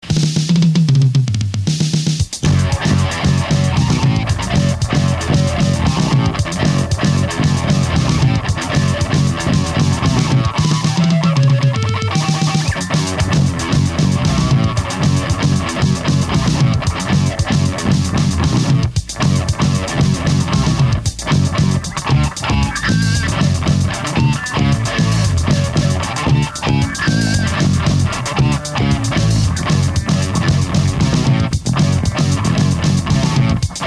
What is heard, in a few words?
Heavy Metal